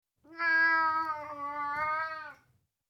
Burmese, Individual Meows, Cats; BBC Sound Effects Library

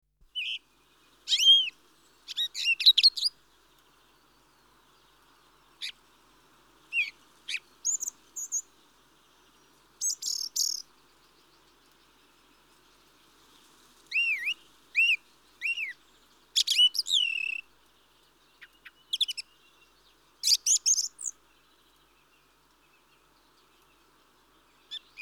Mistle Thrush (Turdus Viscivorus); Birds; The BBC Sound Effects Library

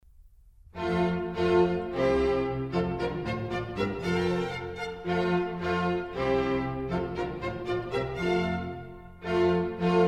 Contredanses, F Major; Mozart; Dances and Marches

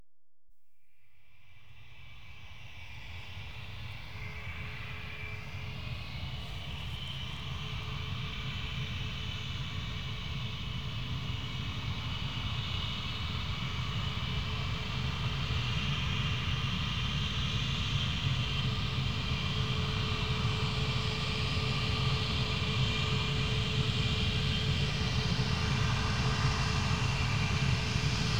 LAND, Aircraft; The BBC Sound Effects Library

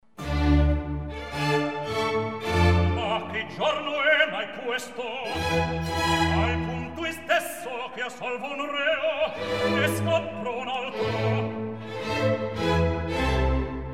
Recitativo Accompagnato; Ma Che Giorno È Mai Questo; Mozart; La Clemenza di Tito

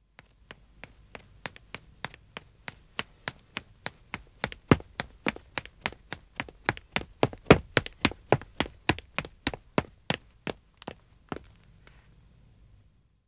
Wood (Dead Acc) Running man a, Footsteps; The BBC Sound Effects Library

